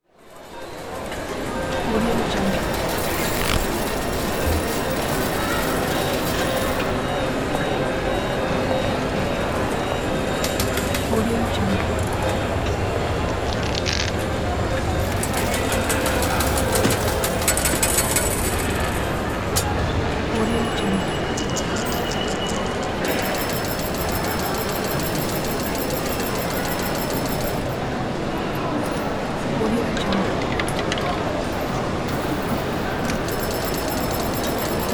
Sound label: casino ambience; mattpear